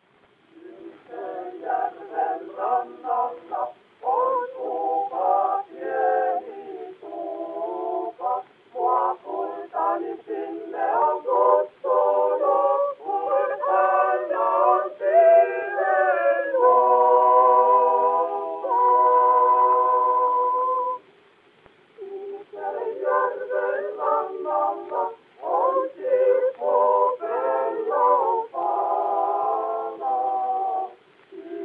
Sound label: Gramophone